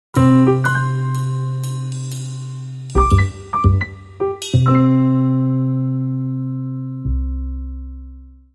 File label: Sting